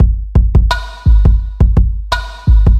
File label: loud and ringy, Boombap drum loops